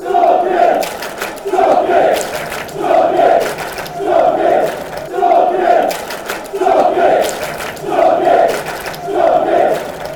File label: clap clap clap, fanchants; torquay